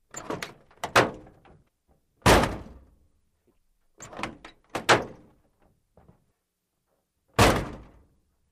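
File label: Car Door Open Close Old Creaky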